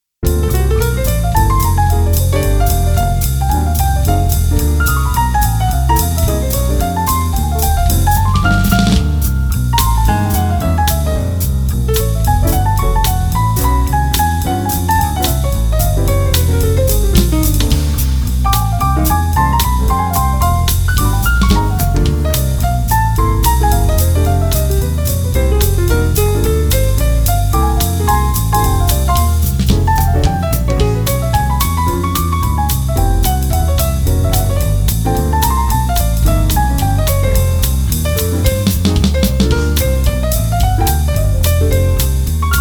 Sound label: caffeine jazz